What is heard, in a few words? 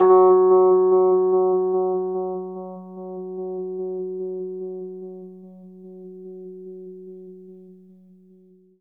RHODES OCTAVE F#; 3D Sound; 3D Keys VOL-1